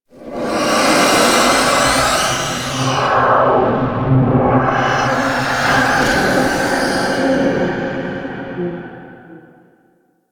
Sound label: Breathy Vocal; Ambience; Man In The Wall